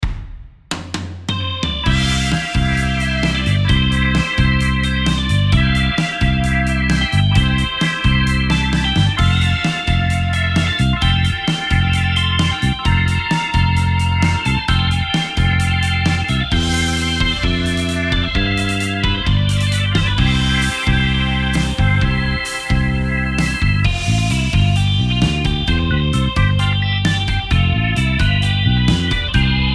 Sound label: Summer sea Music perfect for driving and surfing